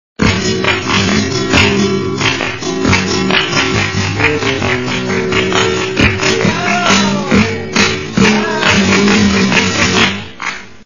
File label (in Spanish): buleria SLOW